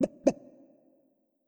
Chicken

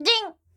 Head Bounce

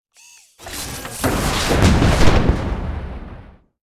Bio Boom

